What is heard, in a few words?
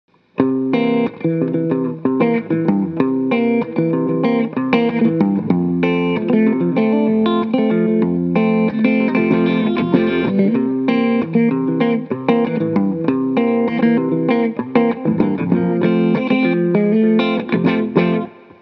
COMP-1 Heavy